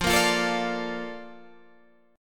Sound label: Bb Major 7th, F